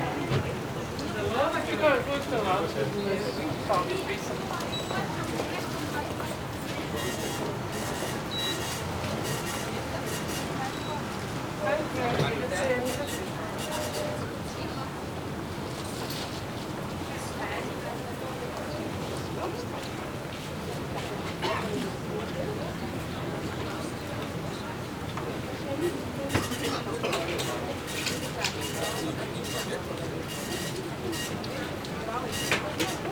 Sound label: Vienna Austria Department Store Cash Area Ambience; Sound Ideas